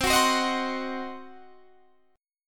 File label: C Diminished, Guitar, Open D